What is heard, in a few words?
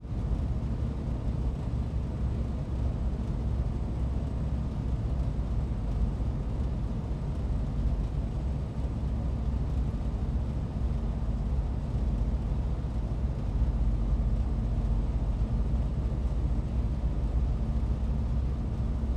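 blenheim mk i onboard taxi to idle turret; 1934; Pole Position; Bristol Blenheim Mk 1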